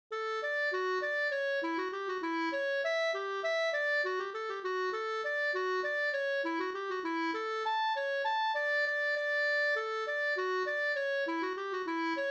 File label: polka; e Oliver Haglund Garpenberg